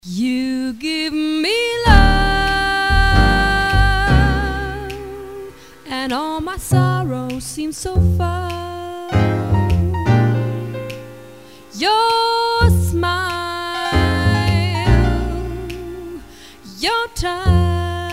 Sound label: Jazzsong